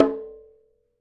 Requinto Hit, Latin Percussion, One Shots; Sonic Foundry; Joe Vitale Latin Percussion

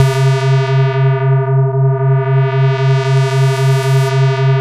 BASS C4; JGL BASS; Jungle; USB Soundscan vol.05; Explosive Jungle; AKAI; 90s sample CD

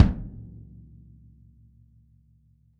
Kick

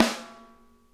Interactive Drum Kits; Sonic Reality; 90s sample cds

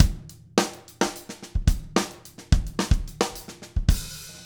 108, drum

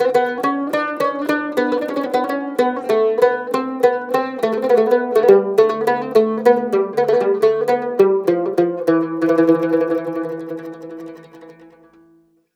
CUMB, CUMBUS, Mediteranean Traditions

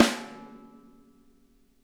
SNARE; Sonic Reality; Interactive Drum Kits

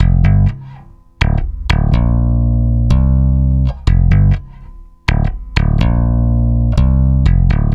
Stick Loop, Stick Bass; Sonic Foundry; Leo Cavallo Bass Taster

